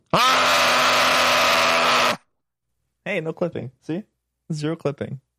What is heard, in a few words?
distortion, distorted scream